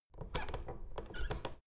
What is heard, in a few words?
door plastic handle use creak soft